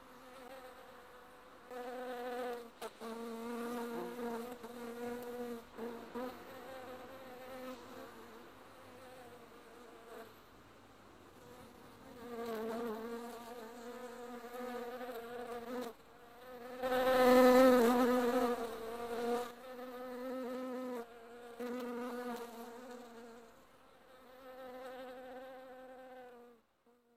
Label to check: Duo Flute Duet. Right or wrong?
Wrong